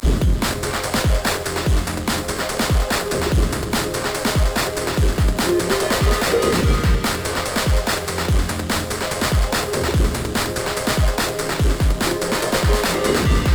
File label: Industrial Cyberbreak Intensity